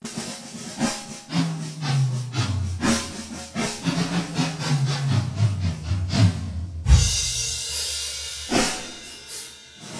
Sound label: Drum Solo; speed 0.56; tsmtoolbox; phasevocoder